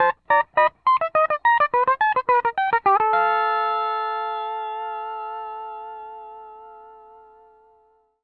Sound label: 105 L, PD LOOPS